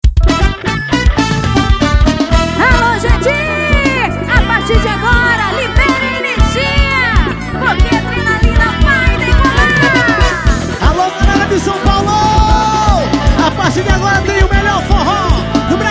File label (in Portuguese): forro molhado abertura